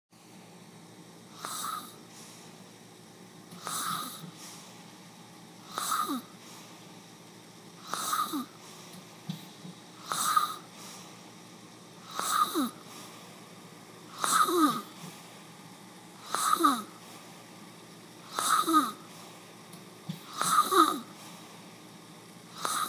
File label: Room tone; Eema